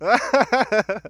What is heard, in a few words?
happiness